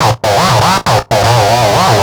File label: Analoop, Industrial Analog; Analoops; Sonic Foundry (Sony Creative Software)